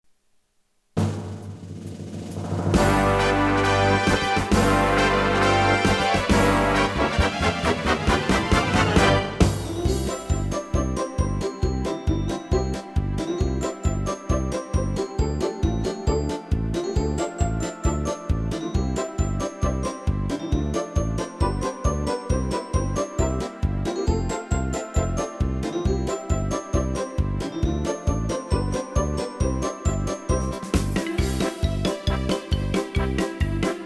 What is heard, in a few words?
Polka, instrumental music